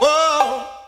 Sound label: woah